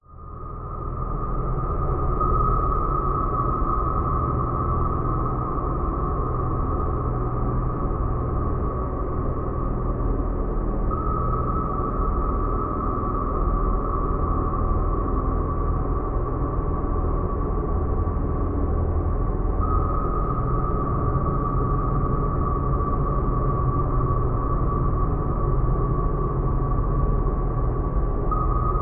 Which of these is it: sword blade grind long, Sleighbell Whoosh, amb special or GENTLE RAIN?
amb special